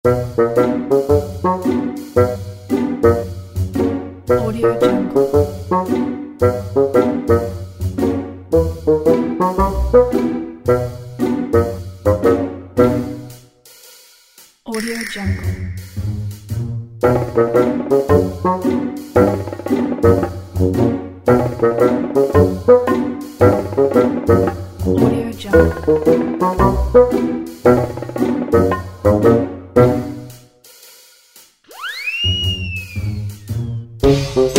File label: comical funny comedy music